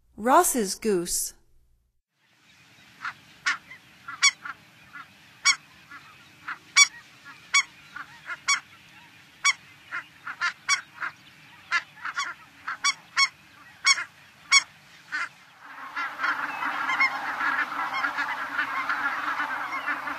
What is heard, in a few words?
Rosss Goose, Songs of Birds of North America; Stokes